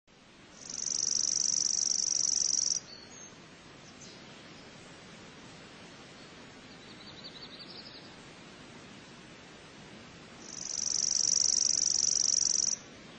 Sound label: Chipping Sparrow, Birds